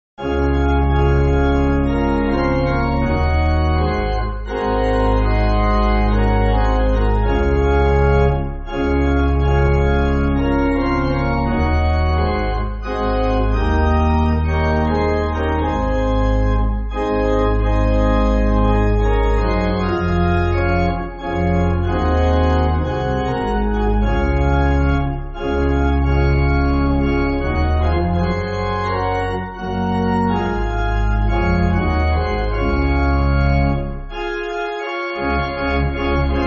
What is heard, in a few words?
Pipe Organ, Free Music Backing Files for Churches; See The Sins